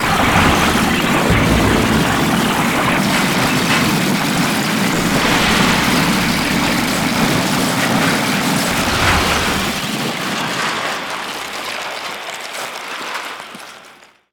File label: collapsing building